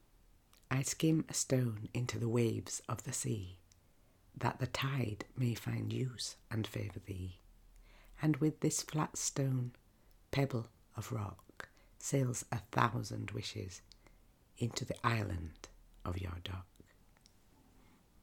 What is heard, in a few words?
skimming stones